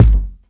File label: Kick 505 Layer